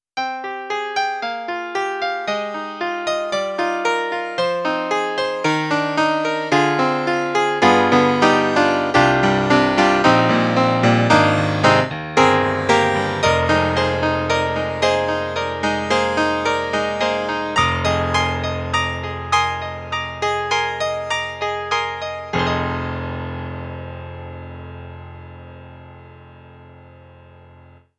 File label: E-MU Sound Engine, Bright Piano; CT1900 Wave Blaster